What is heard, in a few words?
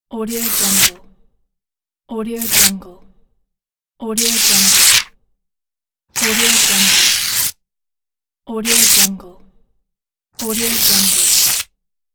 paper tear